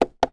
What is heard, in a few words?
household alarm clock button press; zapsplat